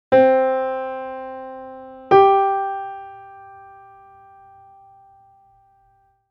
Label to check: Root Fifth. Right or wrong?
Right